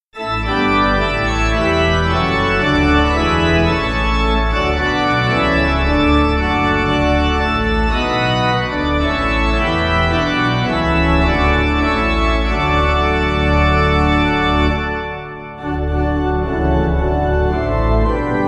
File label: Pipe